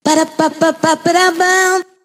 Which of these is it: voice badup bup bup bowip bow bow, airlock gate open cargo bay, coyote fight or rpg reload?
voice badup bup bup bowip bow bow